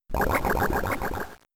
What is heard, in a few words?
Acid Armor; pokemon; gen 3; attack moves